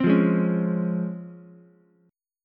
Db Major 7th Db down Ukulele; DbM7 strummed; JGuitar

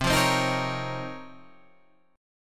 Db Major 13th C

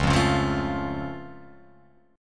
C sharp Augmented, C sharp, down, Guitar, Open F; strummed; JGuitar

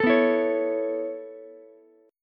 B 9th Suspended 4th B down Ukulele C6; B9sus4 strummed; chord shape; JGuitar

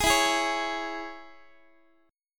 E 6th, down, Guitar; strummed; x,x,11,14,12,12

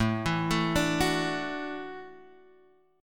E 7th Suspended 2nd Suspended 4th A, guitar

